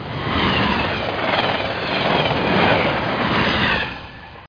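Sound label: train by; Microsoft Graphics Studio Greetings; Microsoft 1998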